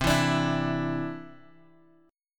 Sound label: C Major Add 9th, Guitar Open F; Cadd9 strummed